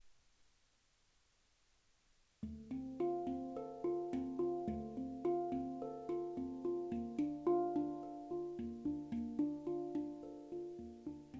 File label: Arpeggio for 2 players